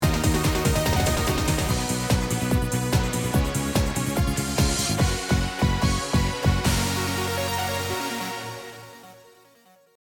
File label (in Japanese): リバースシンバル アウトロ